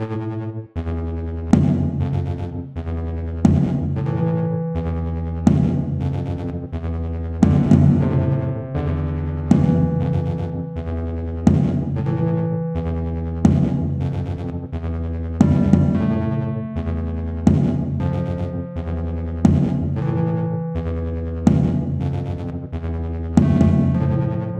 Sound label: title screen loop